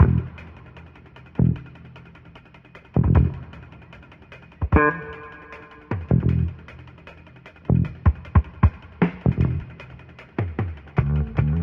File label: keys, Phonky